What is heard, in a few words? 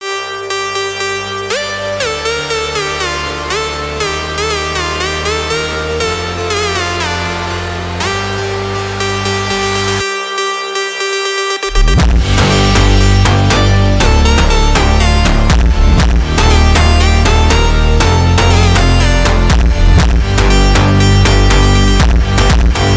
phonk; airtel